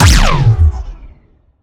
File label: Sciweap Shot Large Laser Astral Disintegrator